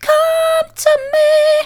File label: come to me soft, vocal